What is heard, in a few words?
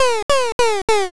witch laugh